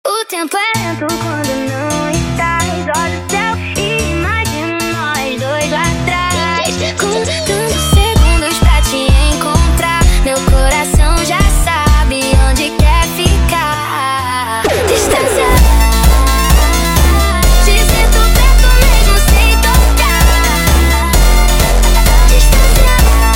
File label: Montagem speed Up, funk